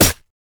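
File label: siege rider basic attack, minions; LoL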